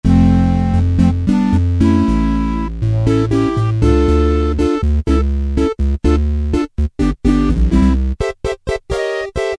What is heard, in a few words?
Voice Combinations, Technics SX-K450; VI mix combo I